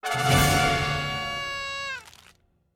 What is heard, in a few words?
Item Reveal Comedy Stinger